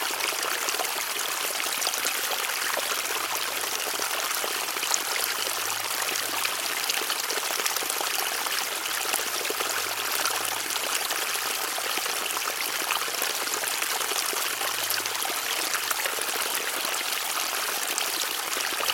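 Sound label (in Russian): первые холодные ручейки тающего снега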